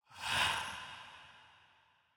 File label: soulspeed; minecraft; enchant